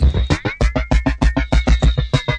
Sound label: 100 the freako filter, drum loops and breaks; 091 to 100 bpm